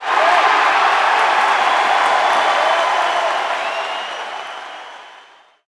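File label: Basketball Crowd Large Cheer; Crowds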